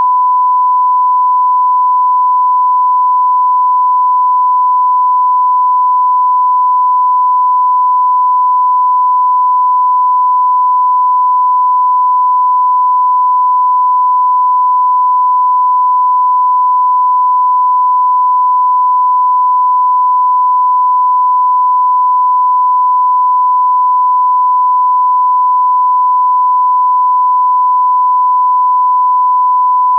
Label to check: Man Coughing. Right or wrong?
Wrong